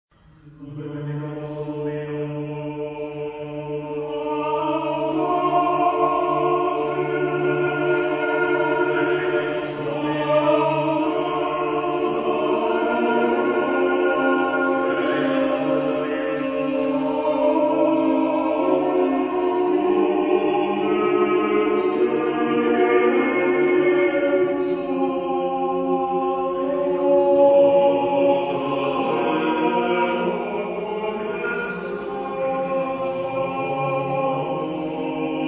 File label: Benedicamus Domino (male); chorus; Penderecki; Missa Brevis